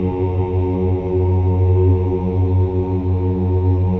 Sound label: CHOIR OOS, F#2; Spectrasonics; Symphony of Voices